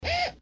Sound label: Gasp